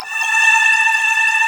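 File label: ambient pad, synthesizer; AKAI S6000 CD-ROM - Volume 1; DIGI PAD FX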